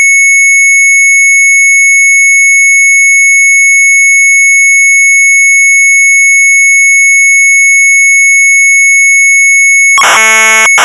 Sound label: MZ800 Tape; CMT GETALLENLIJN; sharp; retromelsarchive